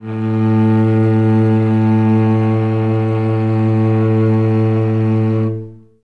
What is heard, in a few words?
cello solo A1; Best Service ProSamples vol.51 - Classic Orchestra 2